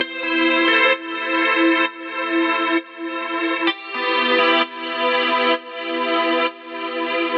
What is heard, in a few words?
130 A Gtr Light Mode; Zero-G; Future Wave